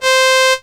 Yamaha PSS 170 Violin 2 C5

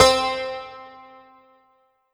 Roland JV-2080 Santur C4

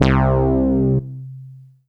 Casio CZ-5000 Synth Brass C2

